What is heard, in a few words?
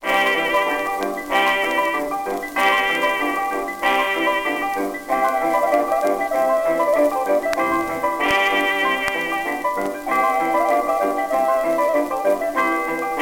Humming, jazz, jukebox